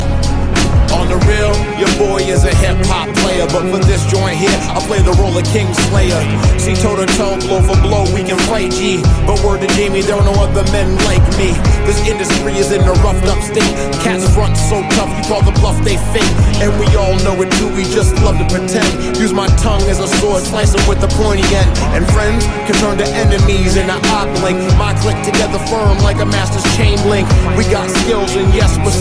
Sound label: Rap Song; Game of thrones